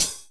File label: phreaky hh, HiHats